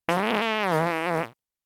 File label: fart; vegan